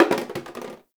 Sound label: Janitor Cart Plastic Bottle Drop; Ambience; Gameplay; Warframe; Nineteen Ninety Nine